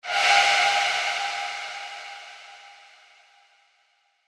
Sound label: Metal Scrape Medium, Horror, Production Element, Imaging Element, Accent, Transition; Warped